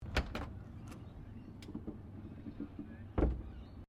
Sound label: Car Holden FE Boot Open